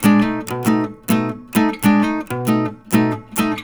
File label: RIFFS, Blues Guitar; ILIO; Fretworks